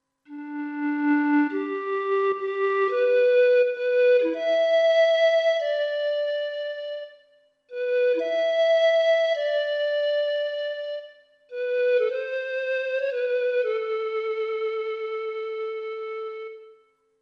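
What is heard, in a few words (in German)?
Recorder App Panflöte; Artinoise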